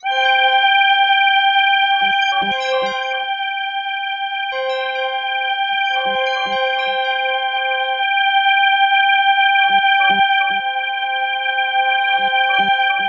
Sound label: C4, Synth Line; 90 s Sample CDs; NiceBeats; SynthLine VOL-17